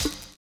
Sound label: SLOWPITCH